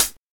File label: tamed closed hi hat sample g sharp key, hi hat one shot tuned to the G# note